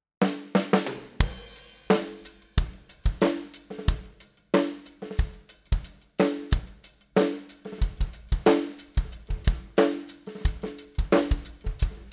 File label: Drums dry